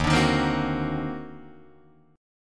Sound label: D sharp Minor Major 13th, Guitar, DADGAD; strummed; 1,5,1,5,1,4; chord